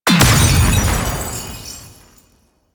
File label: Enemy Explosion; Cephalon Tear; Warframe; Cephalon Weave